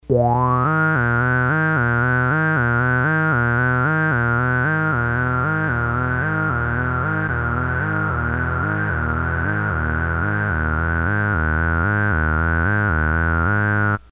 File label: osc mix, synths paia